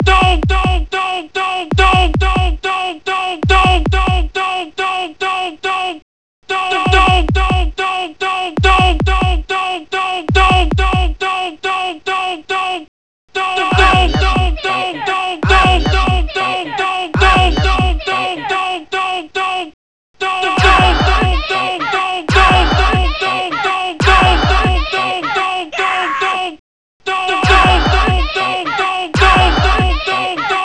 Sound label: Dough beat